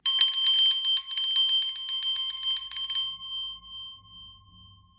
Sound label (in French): clochette DO